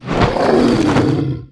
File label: flame die